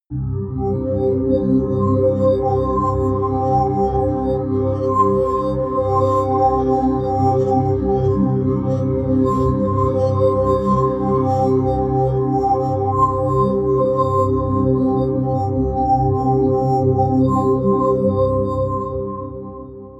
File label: pad sweep underwater; flaechen